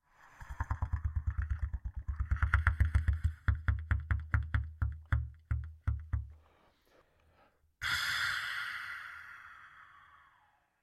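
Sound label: trumpet muted rams air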